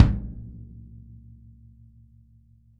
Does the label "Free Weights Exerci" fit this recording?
No